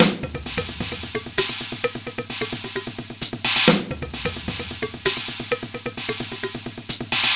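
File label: drum loops, right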